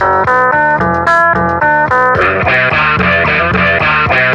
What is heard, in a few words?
keys and guitar, Phonky loops